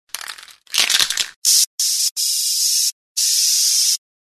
Spray

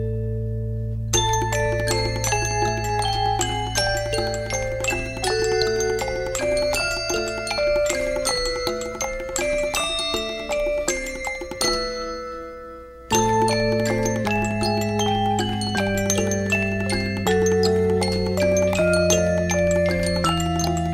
Ting Ring

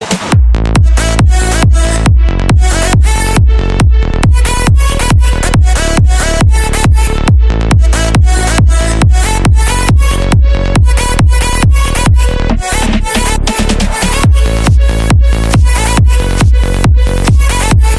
Bass

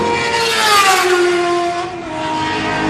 GSX-R750

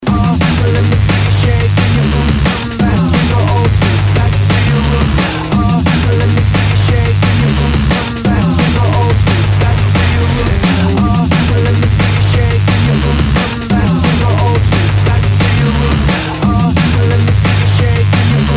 Dnb

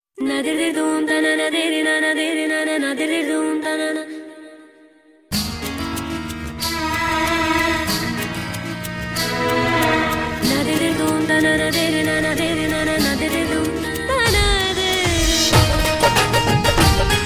Punjabi

